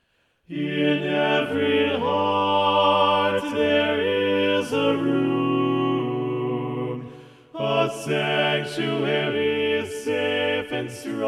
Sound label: Balanced, chorale